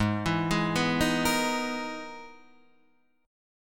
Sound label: E-Major Sharp 11th Ab